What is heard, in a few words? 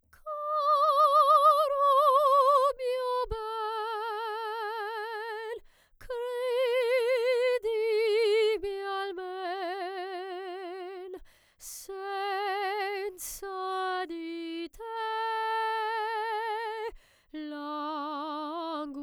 vibrato